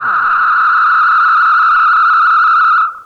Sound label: Tape Delay Feedback